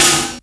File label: NOISE SNARE; Crypton - DrumBase5000; CD DRUMS #2